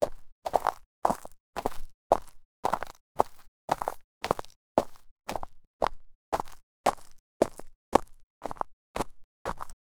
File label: a sound of footsteps on, fast-pace